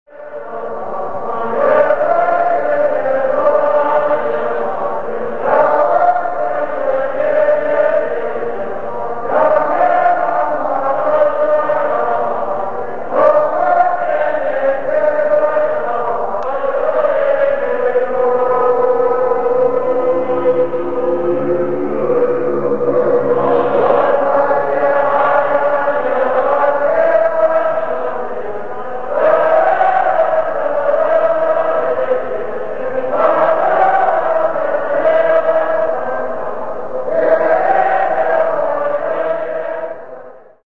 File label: fav monk chant; india; rising chant; special puja; It's my favorite